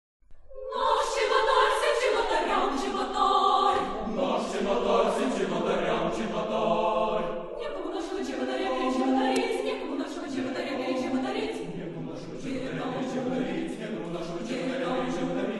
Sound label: chorus; kultur